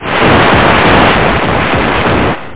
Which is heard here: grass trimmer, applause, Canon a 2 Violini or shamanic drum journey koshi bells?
applause